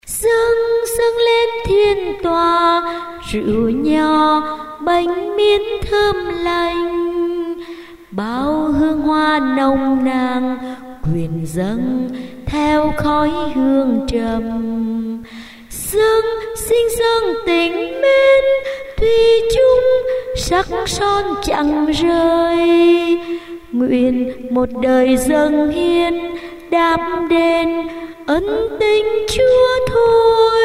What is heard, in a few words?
alto basso